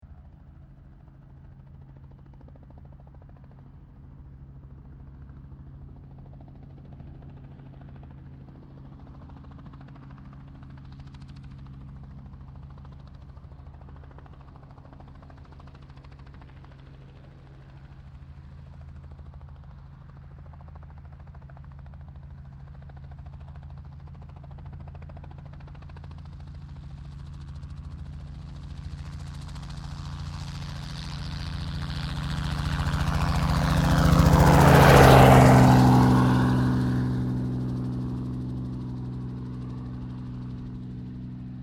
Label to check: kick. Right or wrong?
Wrong